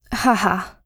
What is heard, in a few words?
laugh; Eternal Return; Katja; ENG